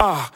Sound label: "ah", male